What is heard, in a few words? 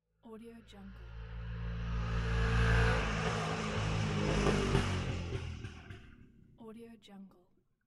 motorbike drove by